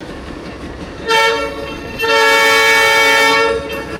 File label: distant diesel train air horn blasts